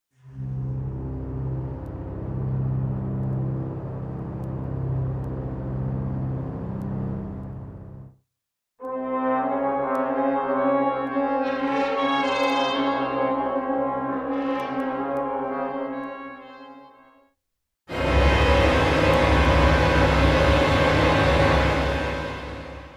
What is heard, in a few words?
motions dark suspense layer einzeln; NI arkhis